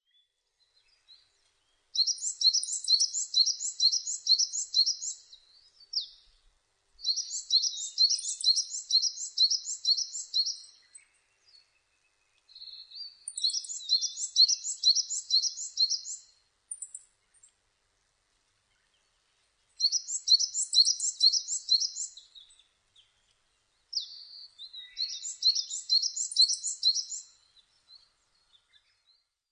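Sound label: coal tit; higara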